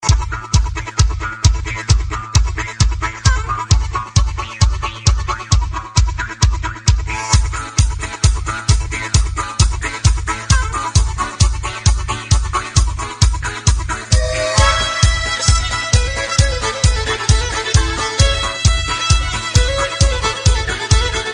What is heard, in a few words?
party dance rap music tone; samsung ringtones